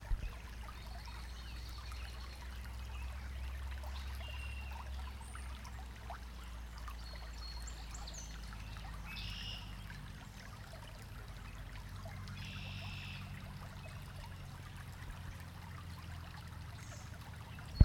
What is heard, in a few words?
mostly water